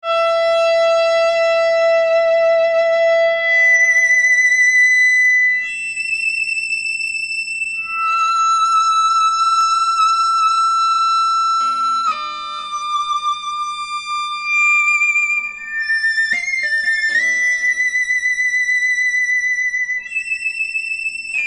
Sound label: foot controller violines; vibesware gr1